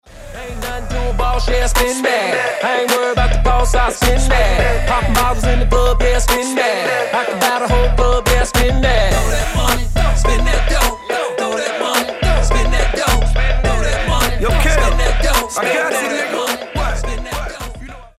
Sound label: funk; multitrax